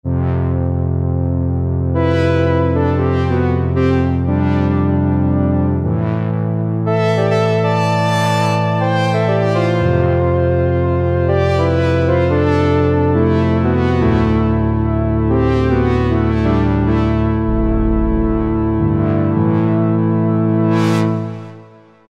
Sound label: Brass; Cheetah MS6